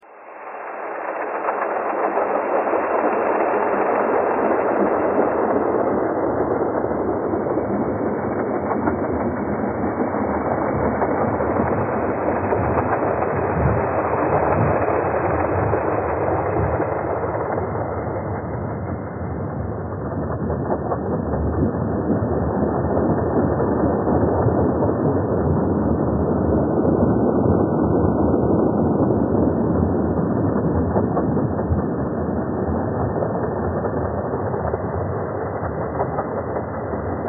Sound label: train thread all